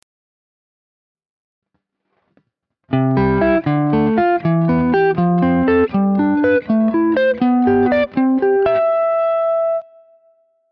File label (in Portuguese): triades abertas melodico